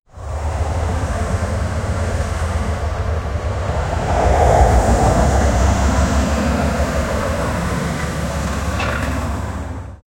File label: cart moving on bridge